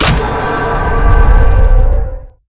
elderkey; mod quoth

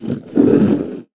idle, ogre; quakemapping

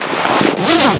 wetsuit, items; quakemapping

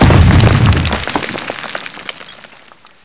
stone break; mod slipgate

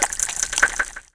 ogre fish flappy

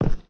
heavy1, steps; quakemapping

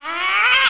magic, xmas elf; quakemapping

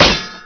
miss

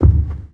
large, steps; quakemapping

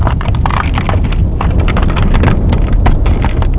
ice quake; mod xj18; bloodshot

